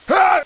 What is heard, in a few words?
death; cstrike; codlife